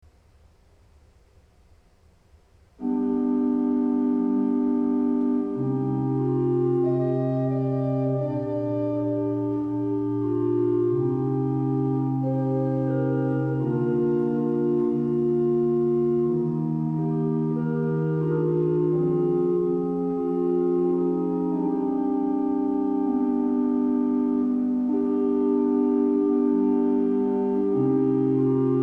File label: Organ Jesus Death